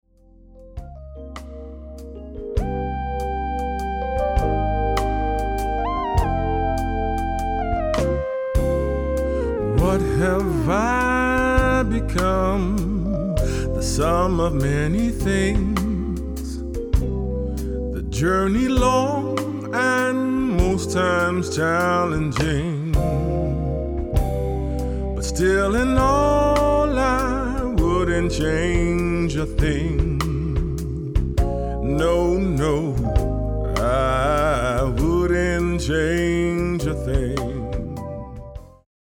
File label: Song; LOFT VOICES